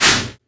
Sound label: Sound FX Scifi Drum Shake; Audiopapkin